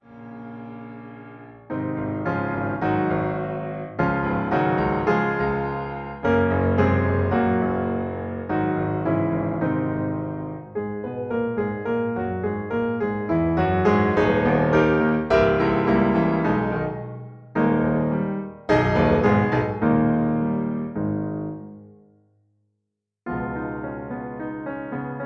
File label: Accompaniment D flat